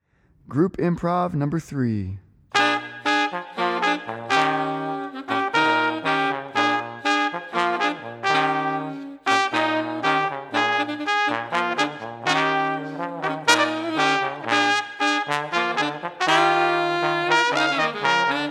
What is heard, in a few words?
group improv